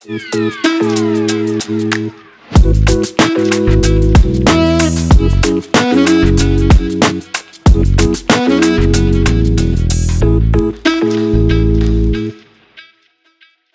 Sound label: easygoing background hip hop